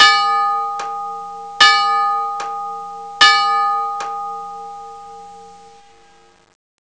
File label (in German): Stationsglocke